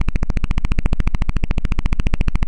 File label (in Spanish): los clics